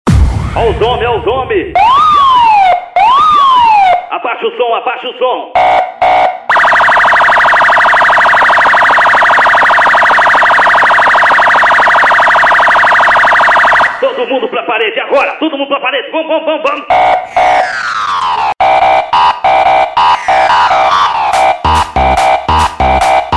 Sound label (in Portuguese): beat sirene policia